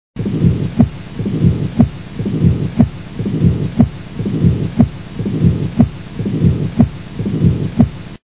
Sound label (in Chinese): 三尖瓣区舒张期杂音; XinFeiTingZhen